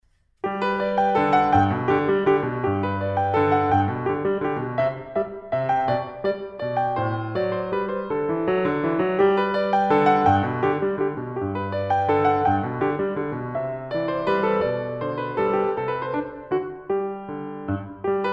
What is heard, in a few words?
Bach Menuet II in G majeur